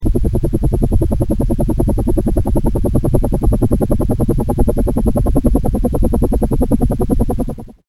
Helicopter

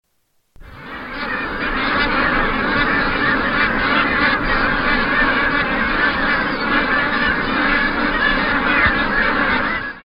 magpie

